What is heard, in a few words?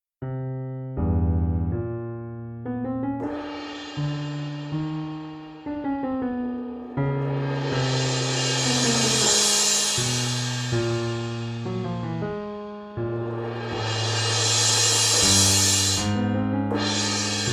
piano cymbal